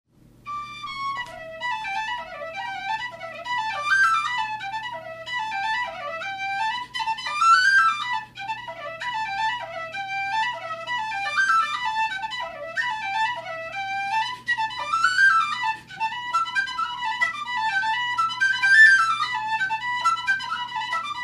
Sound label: whistle